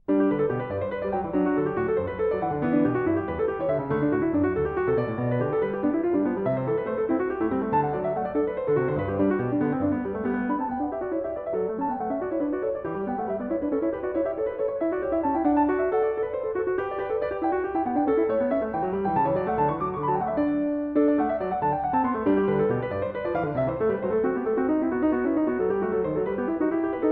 2 Clav.; Goldberg Variations; Bach; Zenph Re-performance; BWV 988

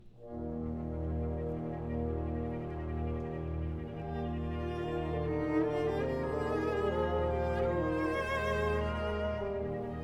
Cello Concerto in G major; Romantic Cello Concerto; Pfitzner; Hyperion

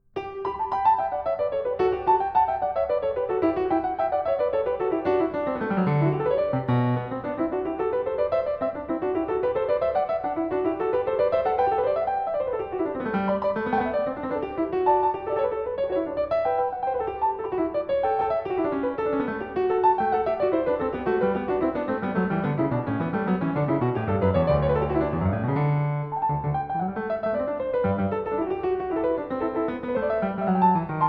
Clav. (Binaural); Goldberg Variations, BWV 988 Variation 23 a 2; Bach

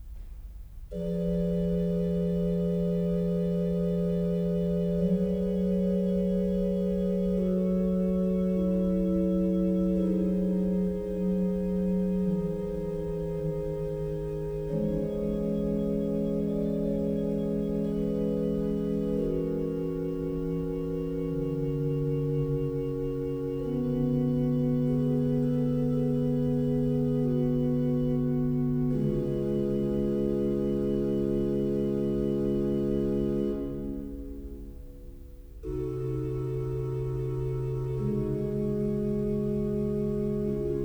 Organ Concerto in A major - II. Adagio; Oscar's Motet Choir; Cantate Domino; Walther; after Gentili

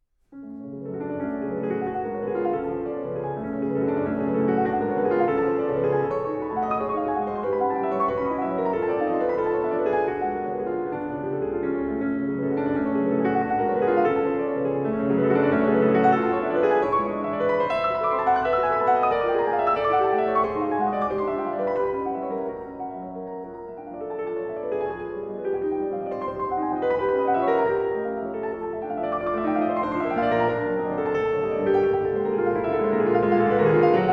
Preludes No 08 in F sharp minor Molto agitato; Chopin; Twenty-four Preludes, Op 28

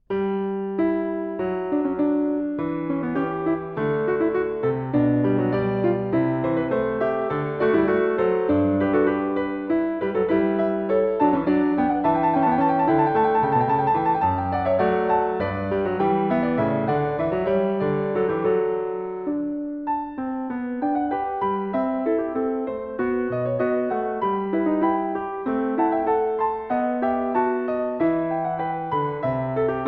1 Clav. Alla breve; Goldberg Variations; Bach; Zenph Re-performance; BWV 988

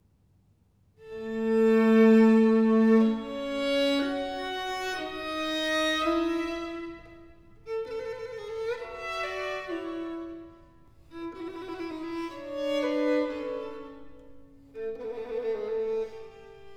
Duo for violin and viola in B flat major, Adagio; Mozart & Haydn; Duo Sonatas; Rachel Podger, Jane Rogers

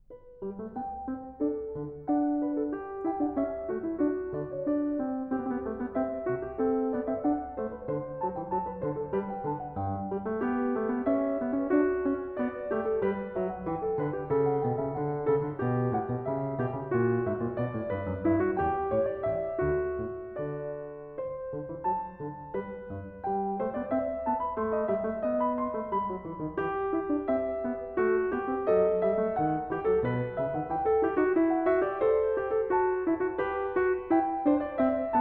1 Clav. Canone alla Sesta; Goldberg Variations; Bach; Zenph Re-performance; BWV 988

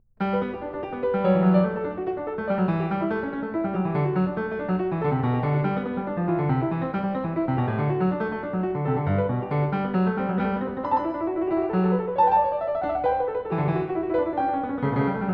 Clav. (Binaural); Bach

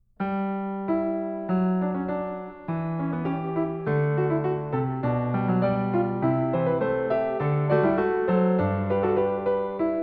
Clav., Binaural; Alla breve; Goldberg Variations, BWV 988 Variation 22 a 1; Bach

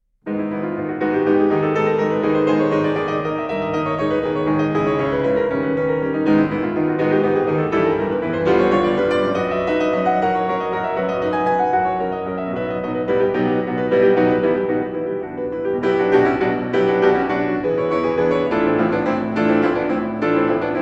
Preludes, in G sharp minor Presto; Chopin; Twenty-four Preludes, Op 28

